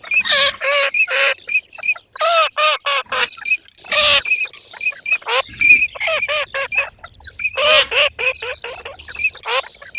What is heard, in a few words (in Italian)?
ALZAVOLA